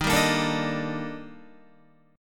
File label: D sharp Major Sharp 11th, Guitar; D#M#11; strummed